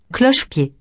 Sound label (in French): cloche pied